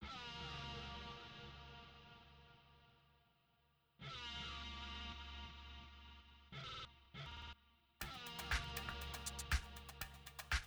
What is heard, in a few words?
Electronic